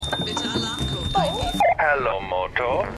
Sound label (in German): Alarm Telefon; Bridget Jones